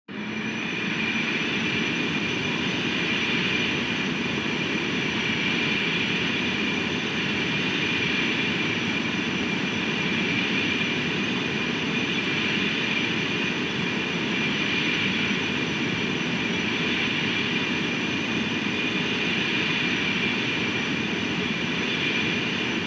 Retro Anime Jet Close

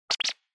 Motion Agility

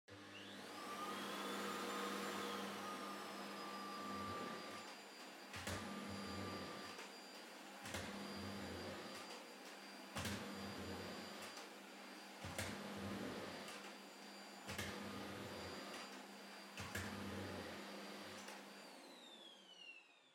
Vacuum Cleaner Far Vacuum Floor Wood Low; 掃除機03-67(遠い バキューム 床 木 低)

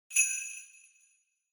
Sleigh Bells Dry Shake Gentle

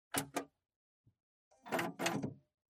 Printer Off

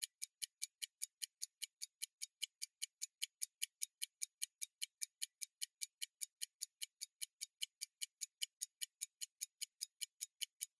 Pocket Watch Tick Table Caseback Open

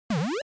NES General Pitch

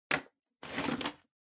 Vacuum Cleaner Paper Bag Remove

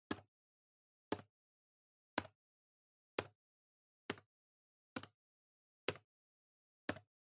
PC Keyboard Single Mid

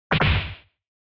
SNES Fighting Hit

